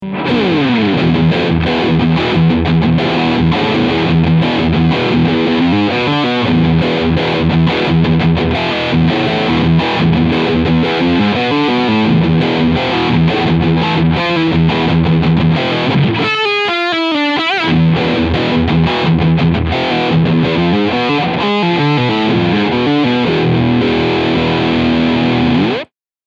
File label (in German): Steg; Riffsound